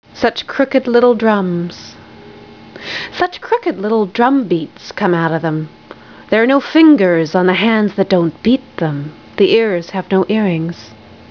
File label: drums